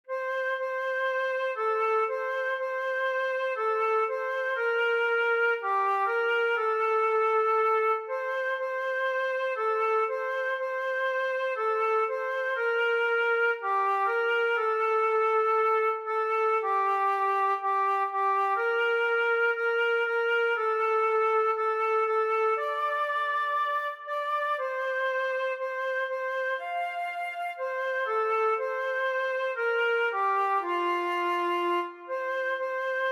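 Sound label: solo flute; Ihr Kinderlein kommet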